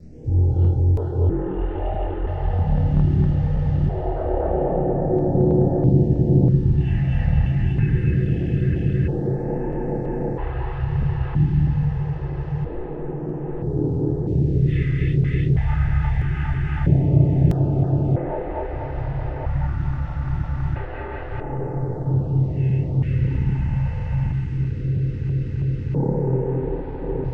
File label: suspense; DayZ-Epoch; dayz sfx